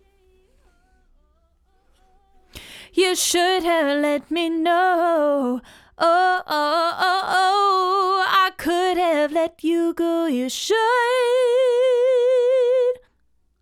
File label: Vox